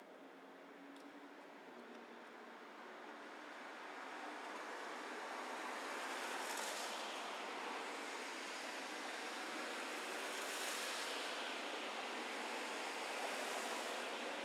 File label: rain